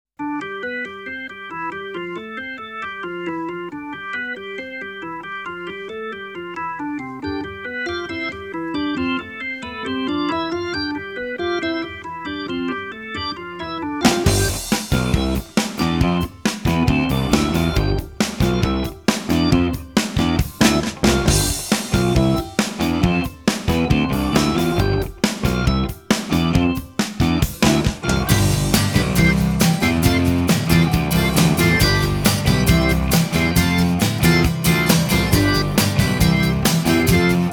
Fruity Organ

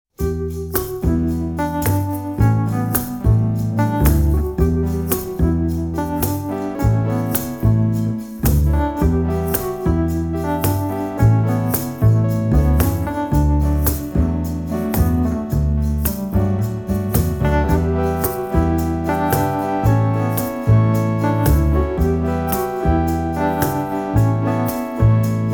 New Orleans Instrumental

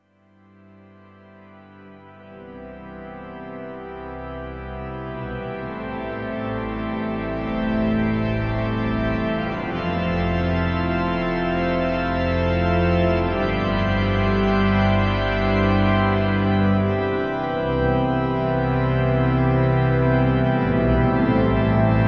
Organ Song

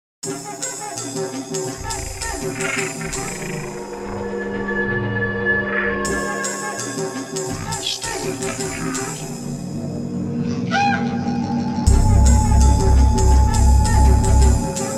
Chomp Samba

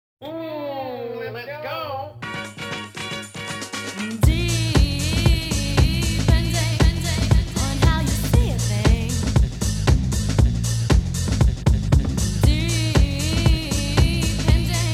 Good Beat

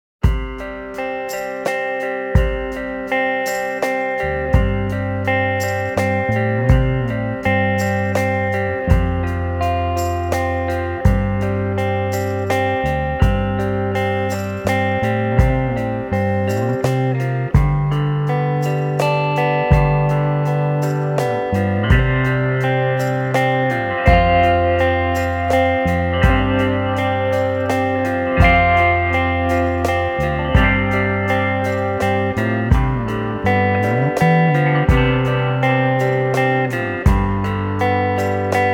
(Instrumental)

